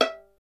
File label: STR VLN, Solo Instruments; Vln Misc; Miroslav Vitous